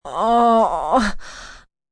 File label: Moan Sharp Pain, Moan, groan, female, short, pain with breath, pain, agony; human voice; Groaning; VoiceBox